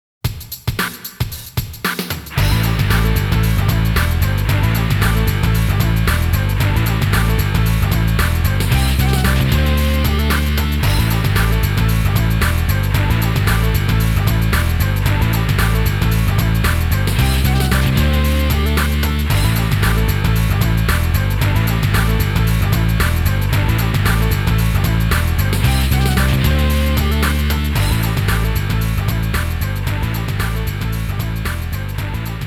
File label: Battle Upgrade; Battle Circuit